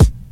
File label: natural kick sample b key